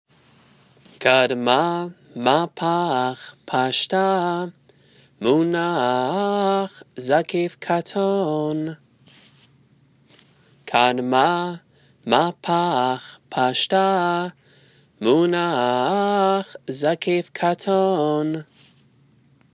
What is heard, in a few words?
katon haftarah, trope; Trope Families